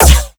Kick Nothing Unusual

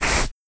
Noise Spray

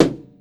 Tom High; K27 Trash

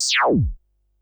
bleep

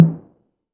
tom; foxdot default

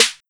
Snare Trap; pitchglitch

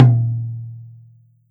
Tom Mid SL; Fesliyanstudios

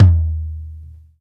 Tom Tom Low; BJAPacific

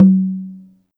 Tom High

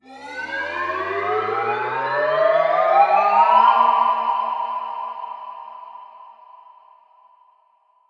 Riser

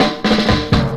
Tom Roll n Break; Drum Digger

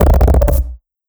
Distorted Bass Tone

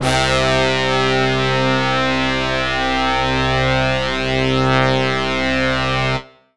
Brass Synth

